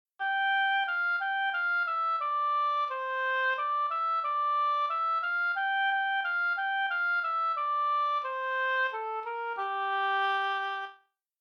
Dorian, scales Minor

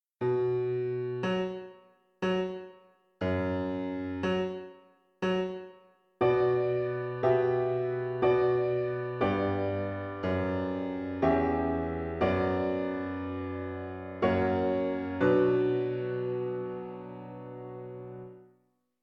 Piano

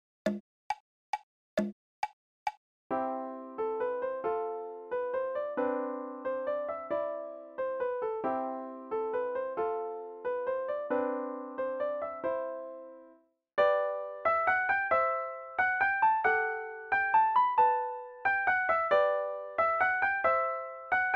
Gurlitt Music Box

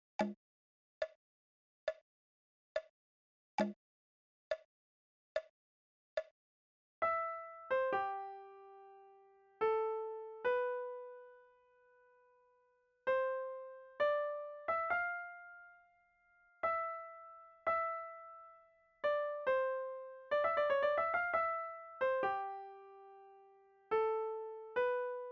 Raindrop Prelude right

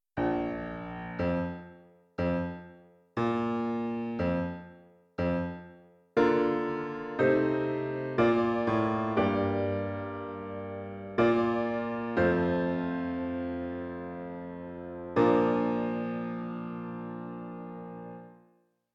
Piano

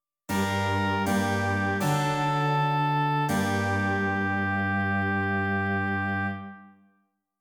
continuo, dictation

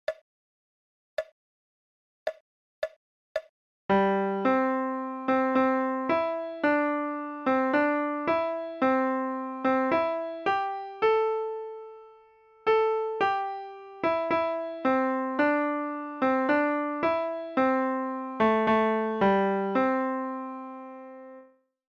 Auld Lang

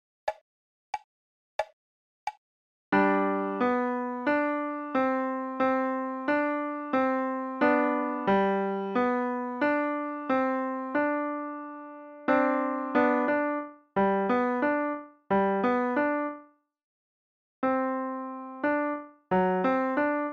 Shoo Fly left

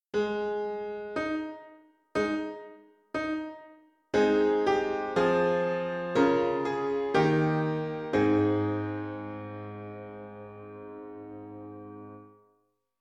Piano

